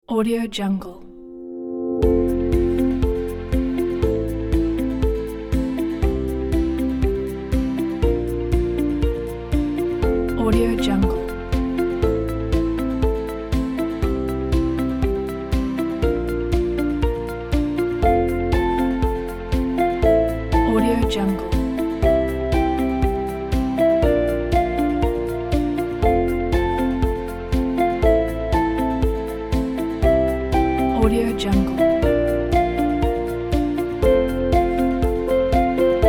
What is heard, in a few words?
hopeful corporate; audiojungle